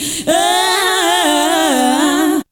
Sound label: VOC